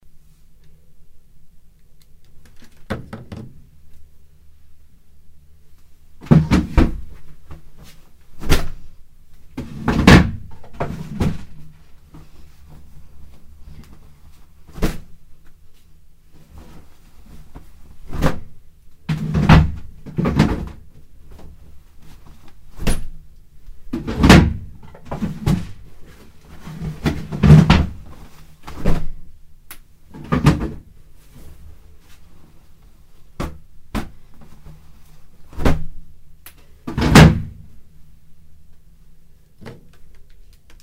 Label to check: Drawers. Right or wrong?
Right